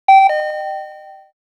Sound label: dingdong